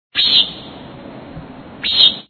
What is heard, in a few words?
safari insects